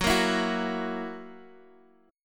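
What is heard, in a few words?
F sharp 7th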